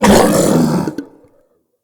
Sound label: Infested Femur Vocal Attack